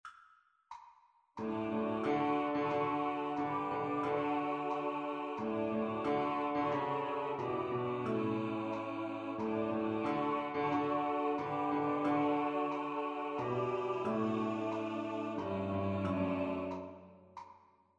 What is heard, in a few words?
Tenor Piano; Kum Bayah